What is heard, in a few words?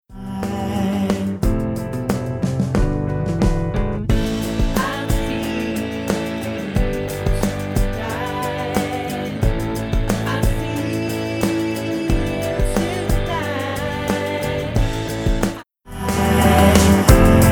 Pop